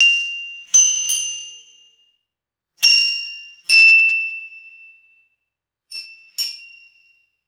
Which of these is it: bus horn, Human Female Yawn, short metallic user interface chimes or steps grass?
short metallic user interface chimes